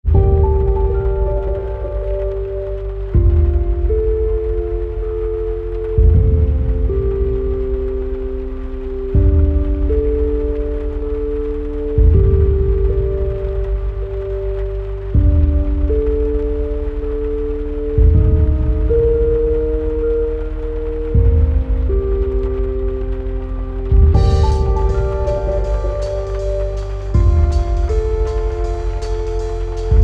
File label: Celtic Harp